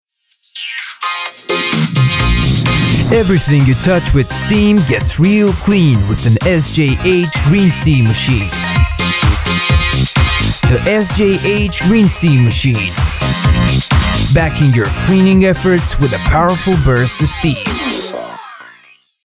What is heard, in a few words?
Jingle; SJH Greensteam Machine Company